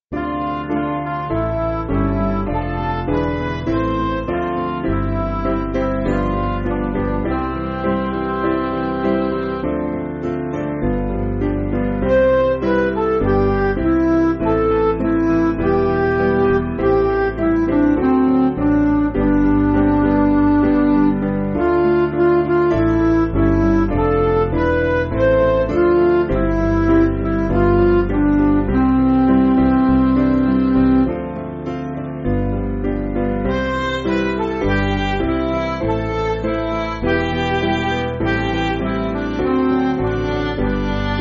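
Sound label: Midi, Music Backing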